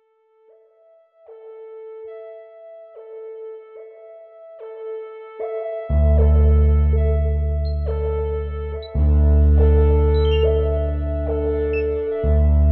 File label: MIDI ditty